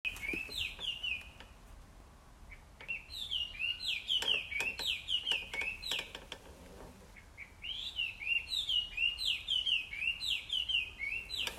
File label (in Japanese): ガビチョウ